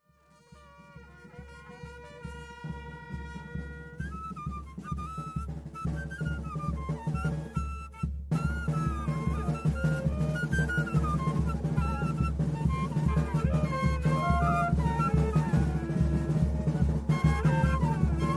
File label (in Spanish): Toque Waynumarinera